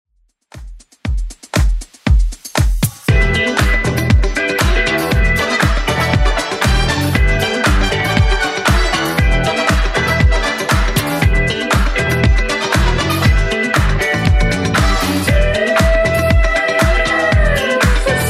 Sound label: 80s Club 118 bpm